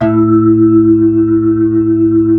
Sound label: B-3, Blues